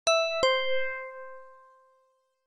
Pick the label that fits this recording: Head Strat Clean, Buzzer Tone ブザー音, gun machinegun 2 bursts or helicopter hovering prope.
Buzzer Tone ブザー音